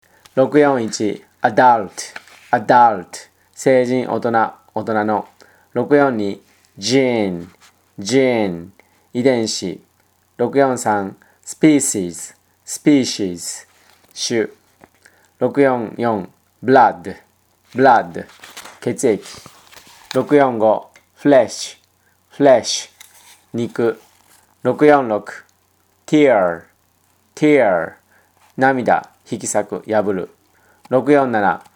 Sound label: tango